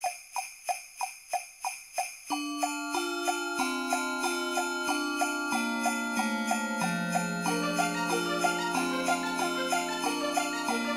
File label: jingle bells